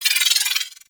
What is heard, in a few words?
Pistol Proj Whiz, weapons; Warframe; Thanotech